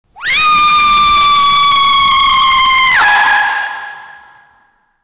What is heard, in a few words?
teenage female screaming; sound effect